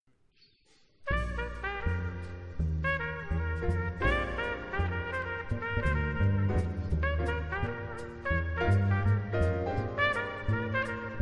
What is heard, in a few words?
Blues in Bb